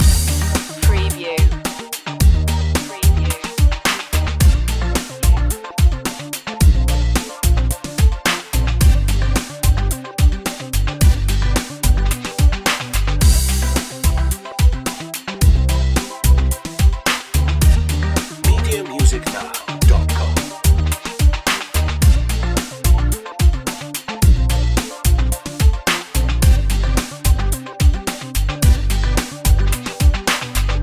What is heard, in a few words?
electro groove loop